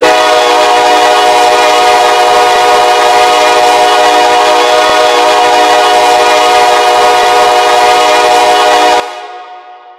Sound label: k5lla, horn; run 8 v3 horn pack